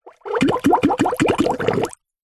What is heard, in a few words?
leisure whoopee cushion air release water shallow far pops bubbles high pitched